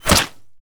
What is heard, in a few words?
bullet impact body flesh